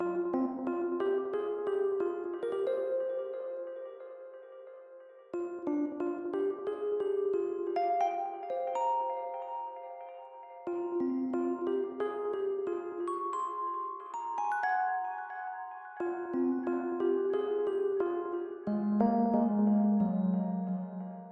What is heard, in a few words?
Rhodes; Toki Tori 2